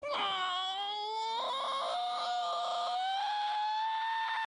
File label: scream; i am a lemon